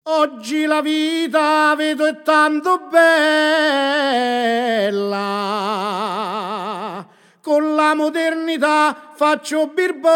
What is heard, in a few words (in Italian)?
Ottava Rima; Tempo Moderno E Tempo Antico